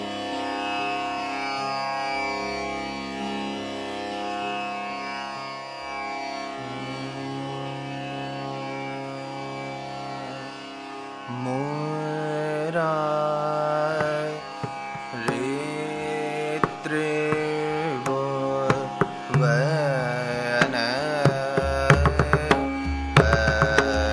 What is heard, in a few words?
Raga Darbari Kanada; Dagar